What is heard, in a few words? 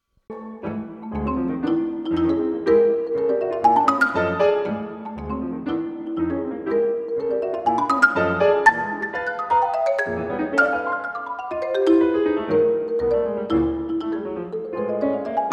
atonal